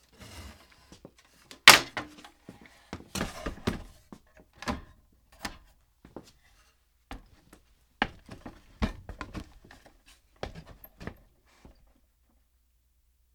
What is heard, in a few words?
Working With A Wooden Ladder